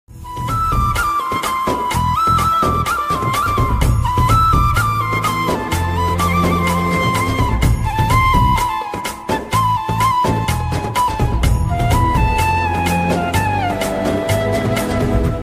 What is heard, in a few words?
love ringtone melody ringtone romantic; kantara